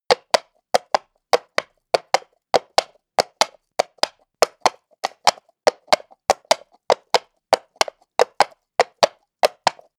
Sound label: horse hooves clack walk asphalt; natur-tiere; pferde